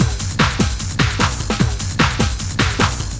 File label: LOOP, Eurotech; Sounds Good